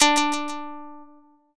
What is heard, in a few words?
shamisen